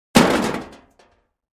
Aluminum Panel Dropp

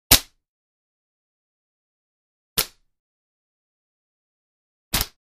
Rubber Band Snaps

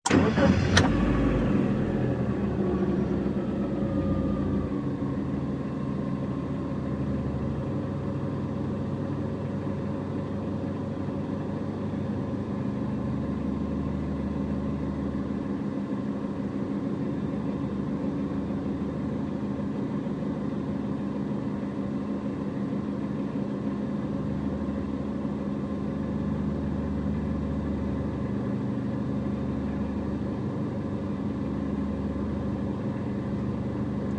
Sm Car Eng Idle Off In, compact

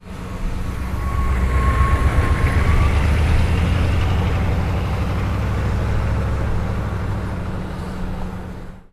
hummer drive off; hummers

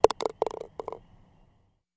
Golf Ball Cup

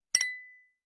toast glass ting

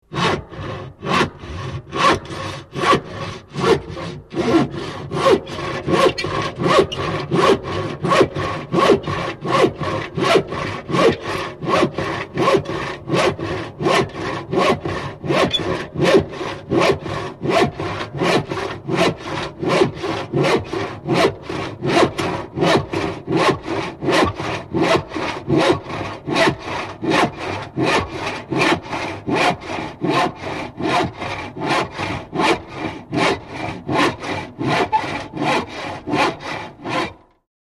Hand Saw Cutting Wood

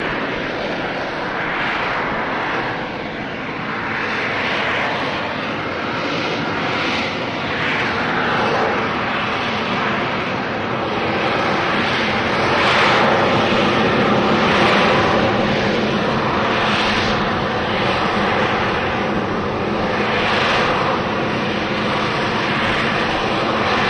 C Harrier Hover Take O, AV-8B Harrier

